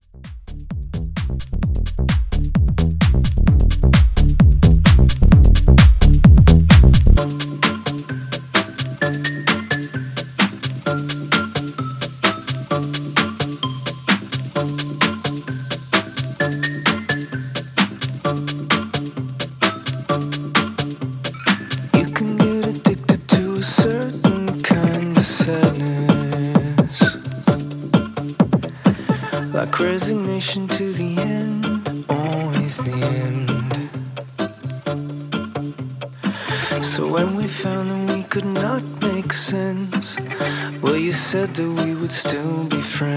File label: Rework